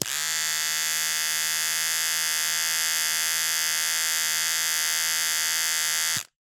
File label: Electric Razor Turn On Run Turn Off